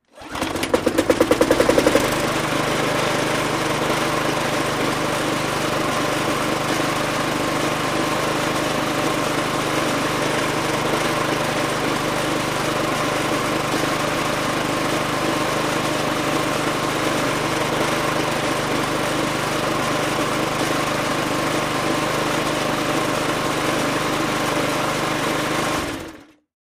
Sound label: lawnmower start